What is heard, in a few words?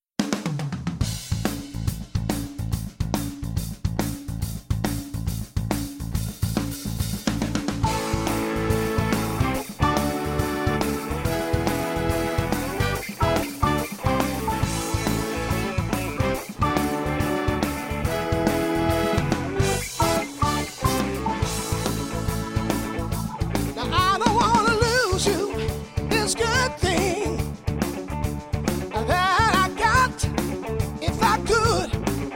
Band